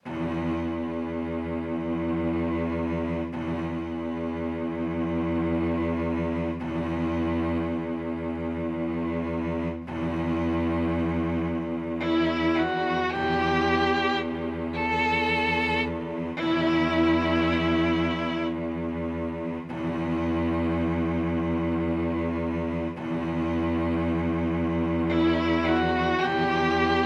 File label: sad strings; Life Rehearched; life rehearsed